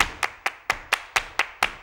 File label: CLAP + STEP, MCLAPS+STEPS; Best Service; World Colours